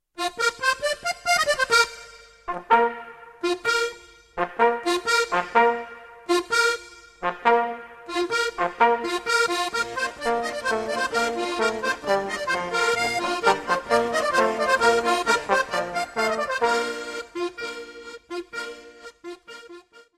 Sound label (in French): Polka, France, Poitou-Charente